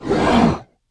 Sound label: roar; The Elder Scrolls III - Morrowind